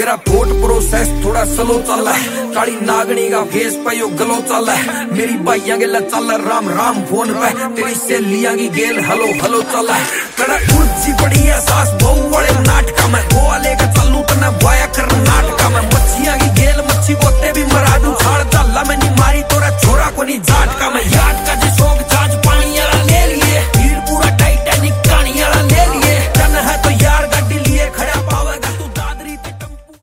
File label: Song Ringtone